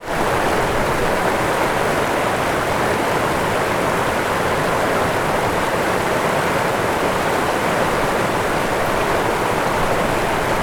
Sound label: river and small waterfalls fast flowing pyrenees france